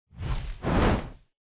flyer fly close; Titanfall 2

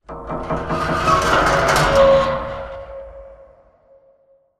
metal stress med; Titanfall 2

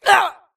grunt death neck; Titanfall 2

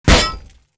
bullet heavyballistic titan; Titanfall 2

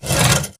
Stalker Damaged Crawlscrape Concrete; Titanfall 2

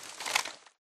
spectre zombie drag grunt; Titanfall 2

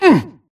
grunt hits; Titanfall 2

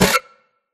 Screen Glitch Short; Boomtown; Titanfall 2

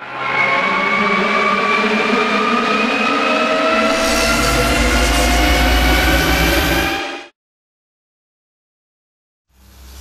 tower major pulse build; Titanfall 2

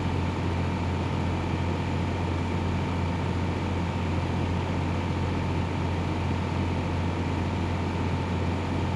generator loop; Titanfall 2

